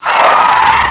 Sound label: monster death; alienshooter